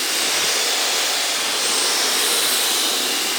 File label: Bioweapon Poison Loop; Warframe; Weapons